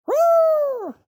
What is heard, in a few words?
player fall; fallguys